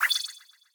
virtual spawn; item

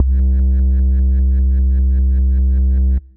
Bass Sub C1; One Shots; Instrument

